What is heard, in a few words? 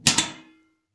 metal open; wood metal SFX